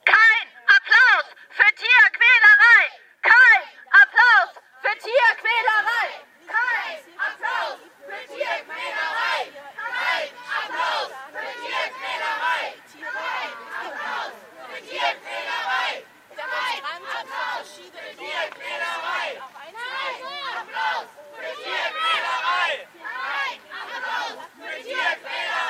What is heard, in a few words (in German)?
demonstration gegen zirkustiere; zirkus probst